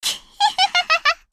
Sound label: kihihihi